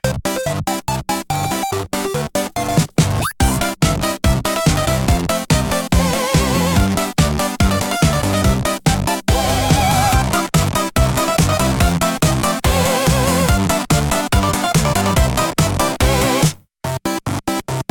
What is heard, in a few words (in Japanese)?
アクション